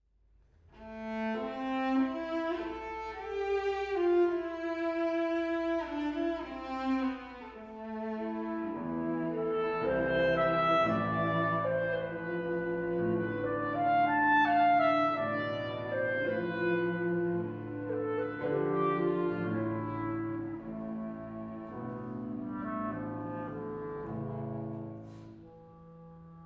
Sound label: Trio for Clarinet, Cello and Piano, Allegro